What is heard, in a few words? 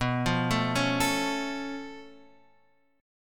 C sharp Augmented 7th B, chord